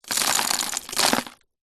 glass pieces drop sprinkle onto thousands more small broken plastic tub; foley; zapsplat